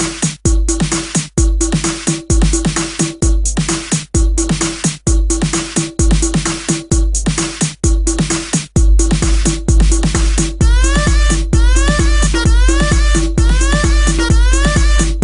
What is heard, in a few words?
Instrumental Techno